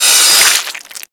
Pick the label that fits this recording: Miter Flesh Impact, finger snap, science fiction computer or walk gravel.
Miter Flesh Impact